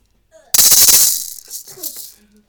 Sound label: Maracas